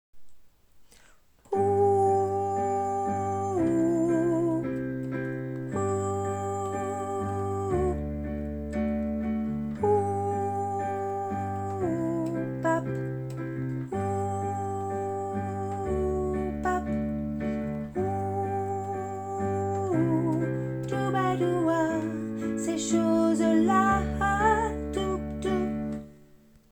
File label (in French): soprano